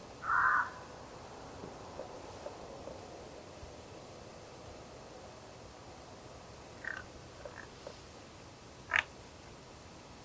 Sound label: quacking